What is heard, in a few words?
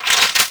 weapon confirm, buttons